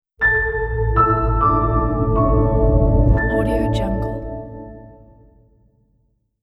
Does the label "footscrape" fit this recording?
No